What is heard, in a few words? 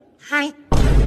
vine boom